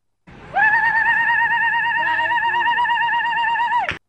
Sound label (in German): zungentriller, tunis